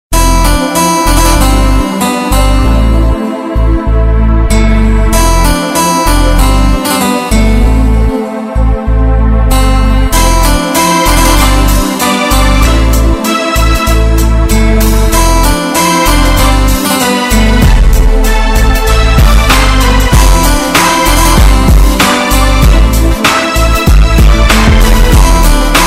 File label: Soft Guitar